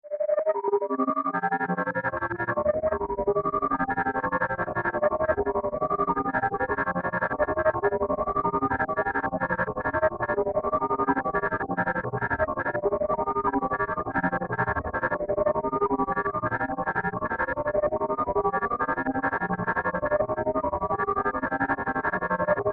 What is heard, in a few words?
Tonal Pattern SciFi Musical Alien Computer Arpeggio; Ascending And Descending; Scifi Science Fic; Tech